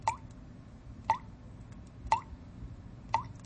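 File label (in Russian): вода капают капли спокойные приглушенные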